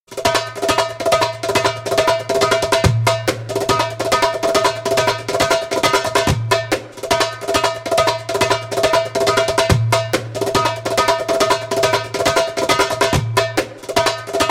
Notorious Fill fast spoken, Djembolale Fills and Drills